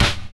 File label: Clap